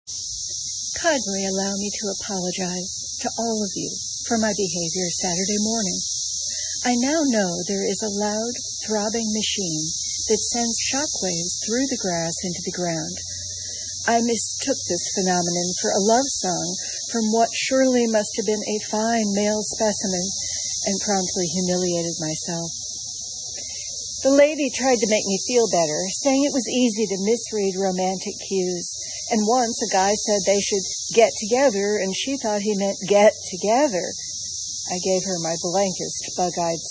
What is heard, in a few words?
cicadas